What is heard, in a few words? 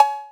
Cowbell 808; gate experiments